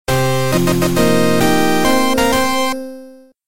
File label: Victory; Hiragana Pixel Party